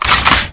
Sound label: m1887 draw, weapons